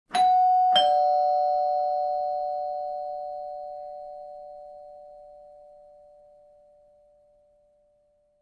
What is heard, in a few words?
DJ Effects chime